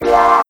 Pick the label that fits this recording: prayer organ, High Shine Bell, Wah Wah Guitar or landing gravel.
Wah Wah Guitar